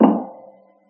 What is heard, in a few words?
metal impact; quakemapping; mod quoth